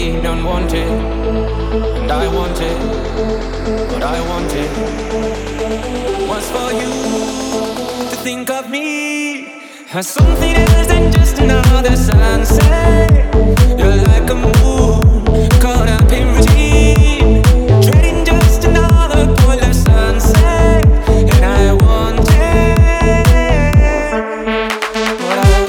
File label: Drummer EDM Remix